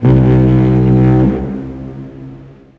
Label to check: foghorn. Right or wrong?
Right